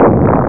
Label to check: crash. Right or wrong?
Right